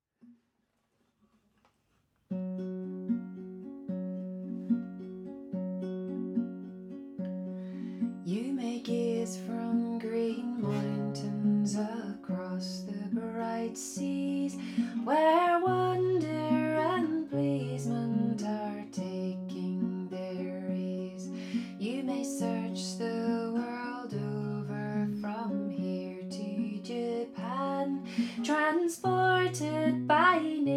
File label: Melody